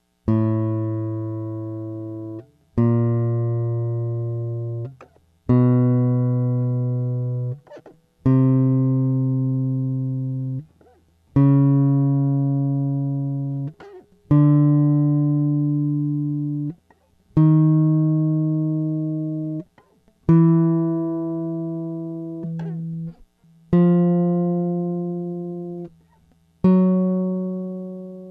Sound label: yamaha fishman, guitar